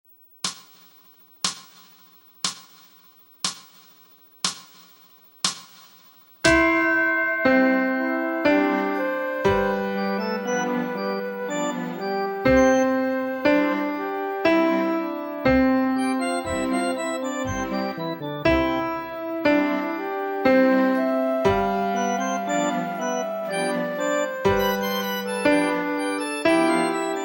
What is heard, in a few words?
Chimes